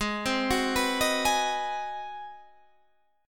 G sharp 7th Sharp 9th G sharp, down, Guitar, Drop D